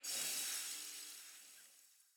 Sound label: logo